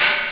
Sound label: canta, drums misc; OctaMED SoundStudio V1